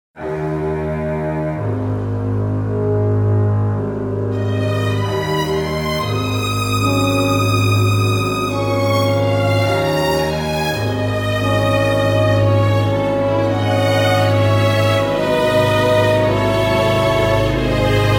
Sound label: Eb No Backing Vocals